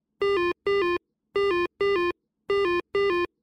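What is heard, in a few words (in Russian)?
звук домофона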